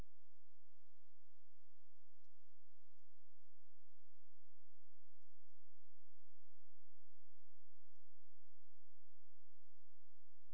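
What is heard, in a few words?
hydrophone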